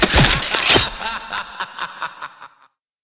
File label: knife stab; cstrike; saysounds